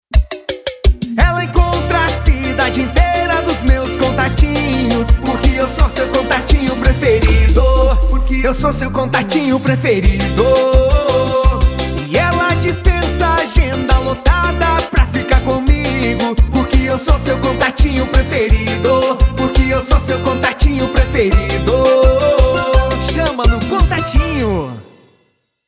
Jingle Parodia; Plataforma Encontrei

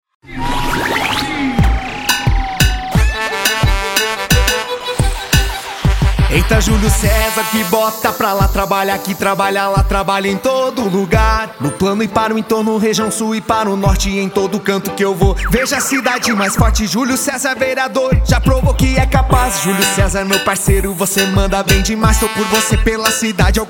Jingle VEREADOR; Já provou que é capaz

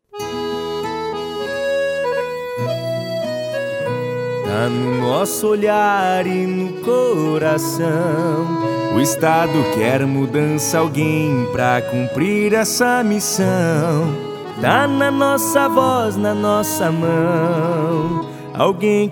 Jingle DEP ESTADUAL

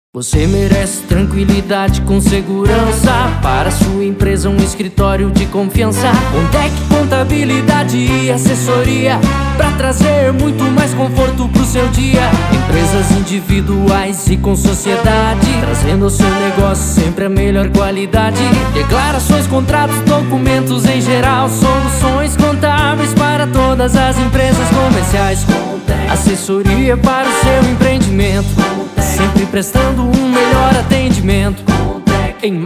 Jingle; Contec

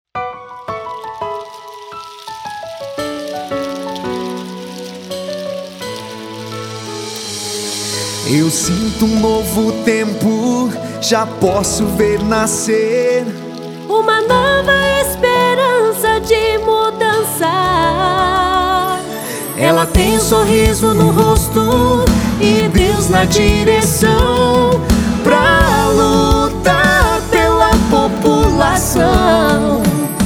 Jingle Inédito DEPUTADA ESTADUAL

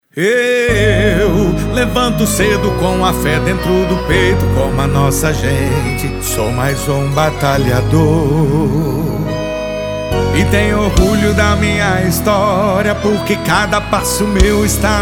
Jingle VEREADOR

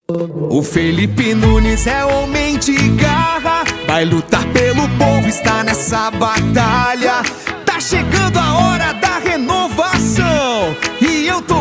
VEREADOR PRE CANDIDATO; Felipe Nunes; RENOVACAO

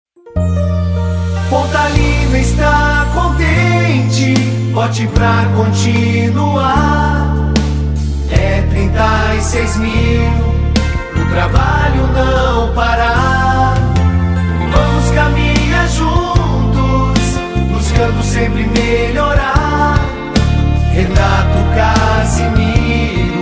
Jingle Inedito Vote pra continuar VEREADOR; Pontalina

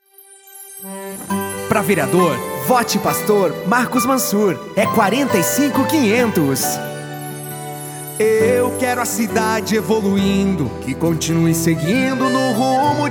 Jingle VEREADOR

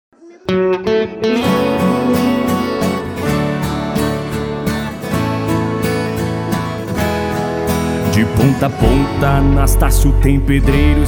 Jingle Parodia Materiais de Construcao; Renovacao

